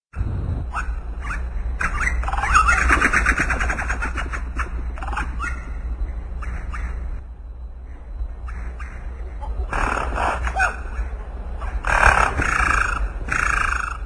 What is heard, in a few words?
monkey chatter